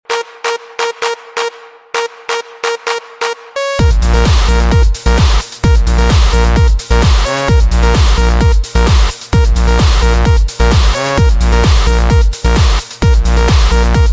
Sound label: Electro Sms